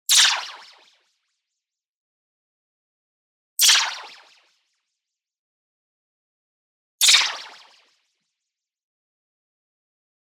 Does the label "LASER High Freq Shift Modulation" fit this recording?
Yes